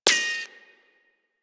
Bullet Impact Ricochet; Warframe; Weapons

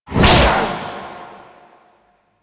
Impact; Penance; Warframe; Warframes; Priest

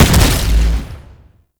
Infested Shotgun Projectile Explosion; Weapons

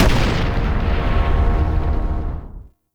Rocket Impact; Machines; Jackal

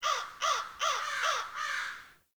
Bird Crows; Warframe; Ambience; Nineteen Ninety Nine

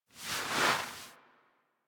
Foley Idle Roll; Enemies; Warframe; Catbrow

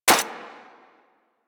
Pistol Mech Initial, weapons; Warframe; Thanotech

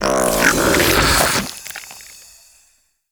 Infested Drop Pod Retract; Warframe

